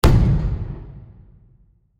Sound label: explosion, fireworks